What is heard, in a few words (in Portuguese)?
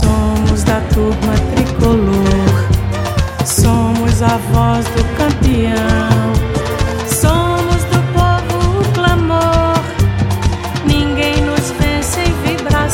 Hino Do Bahia